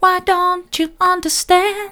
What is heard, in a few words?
why don't you understand soft, vocal